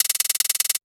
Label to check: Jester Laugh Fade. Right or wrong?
Wrong